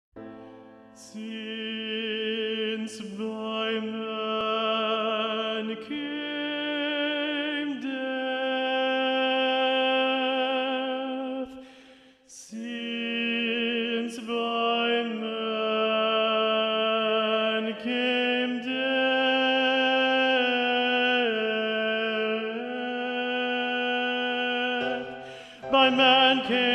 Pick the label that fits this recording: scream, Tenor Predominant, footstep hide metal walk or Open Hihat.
Tenor Predominant